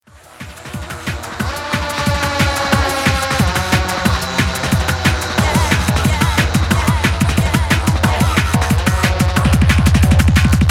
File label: arp